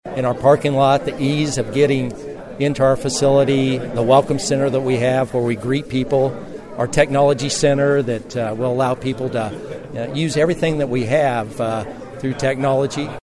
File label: harp